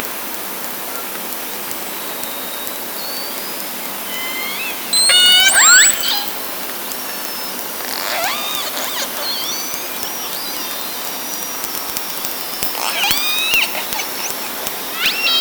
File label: Orca sound Night Passby